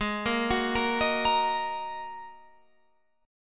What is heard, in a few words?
G sharp 7th Suspended 2nd G sharp, down, Guitar, Open F; arpeggiated; G#7sus2